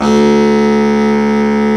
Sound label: BARITON SAX